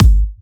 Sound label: Kicks; Vengeance Samples